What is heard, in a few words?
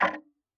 Tick, Clocks Kitchen Clock